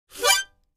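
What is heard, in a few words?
Mouth Organ Short Fast, harmonica; woodwinds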